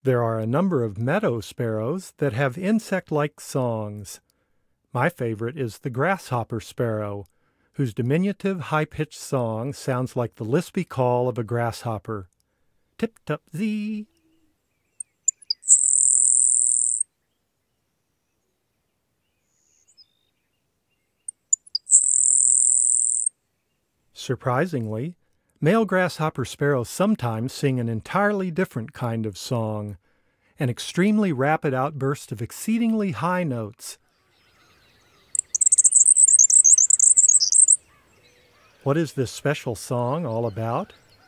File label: Grasshopper Sparrow; North America; Common Western Species; Know Your Bird Sounds